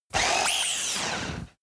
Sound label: hissing robot; roboter; comedy-cartoon; soundpool